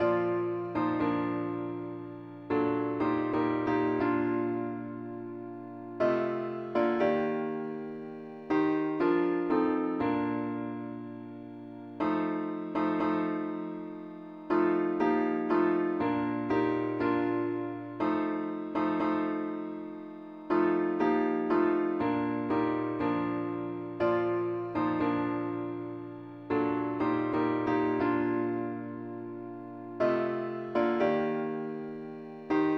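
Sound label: satb Tune keyboard; pilot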